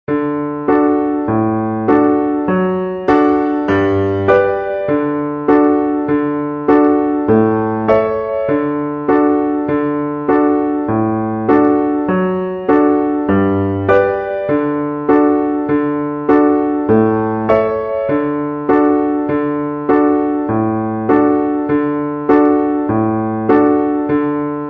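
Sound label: Key of D Accompaniment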